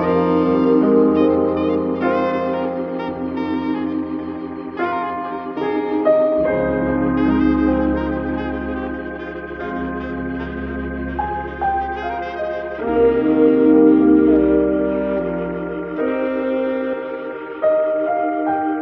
piano and sax and keys, loops, phonky

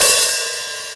Real Open, Hats

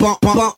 bump babump, Voice; ooh ahh

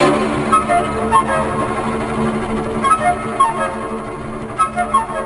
strings tensile, Sad Loops

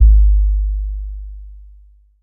quelch; Heartbeats

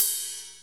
woke, Hats Real Open

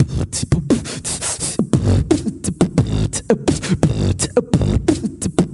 koakoa, Beatbox, Drum loops

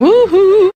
woo hoo SUNG; Voice; ooh ahh

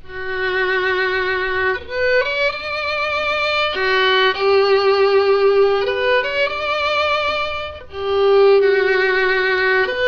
strings tear jerker, Sad; Loops

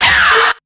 ow; Voice

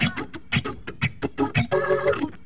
keys, Phonky; loops

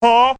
"huh"

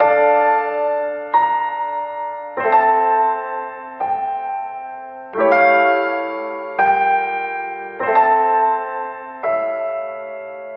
piano downright strange, sad loop; Loops

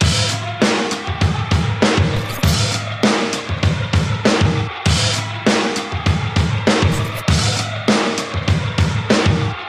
noisy, Boombap Drum loops

